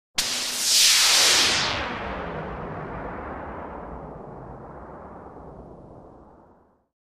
Long Synth Zap Whoosh; zaps

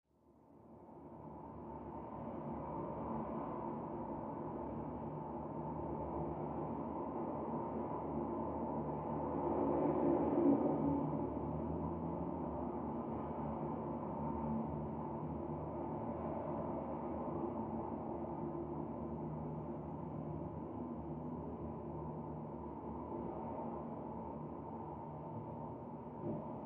Traffic Light City

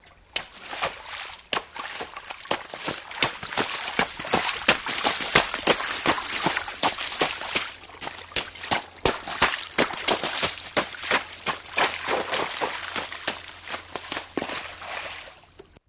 FS Run Throu Stream, footsteps in water

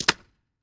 Bullet Impacts Water

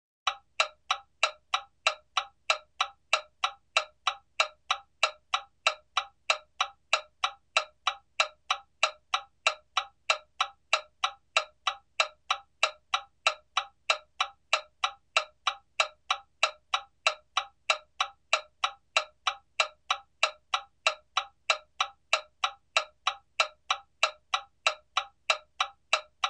Clock Tick Series

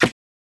Plunger Step Suck

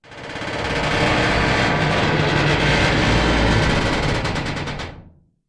door creak med, squeaks

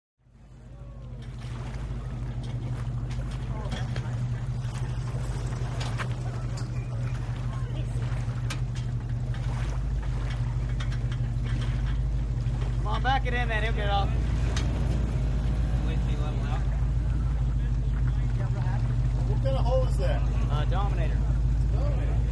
Drag Boat Loading

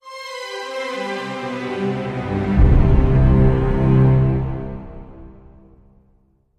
Orchestral Msx Logo

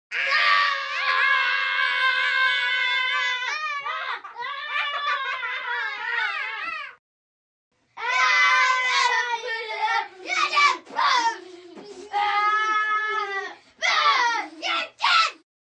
2 Boys Yelling

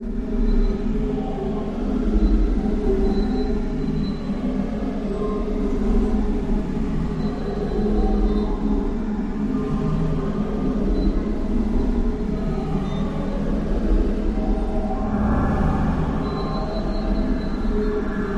Merry Go Round Rusty Metal

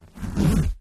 zipper; zippers

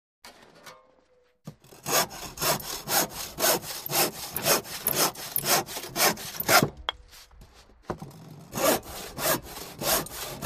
Sawing Hand Saw

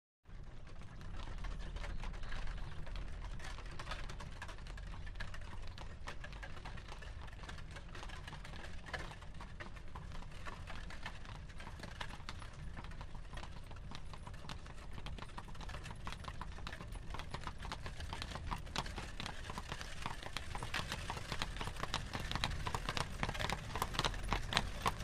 Carriage By Slow 1 Tar, carriages

